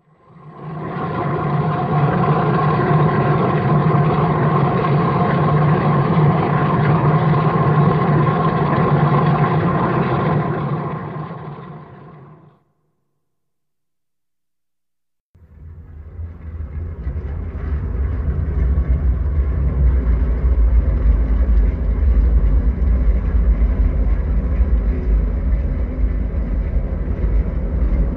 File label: Sucking Vortex Vacu, ghosts